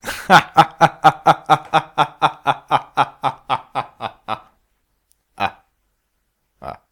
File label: Nonsense Laughter